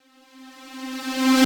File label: Tonal Reverse - C; House; FX; Hype Tonal Reverse